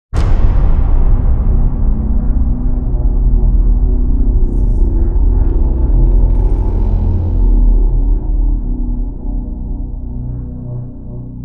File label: Boom Drone; Epic Tools vol. 1